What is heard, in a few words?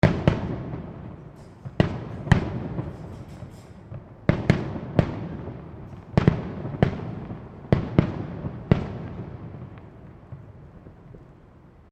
Fireworks Small Display Explosions; CraftedSignals